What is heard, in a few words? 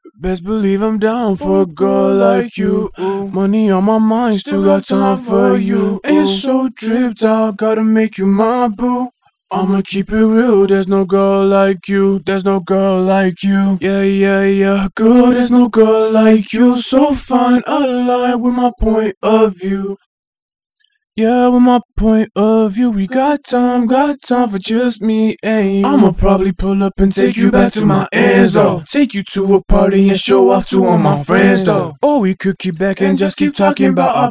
pop rnb 83 GM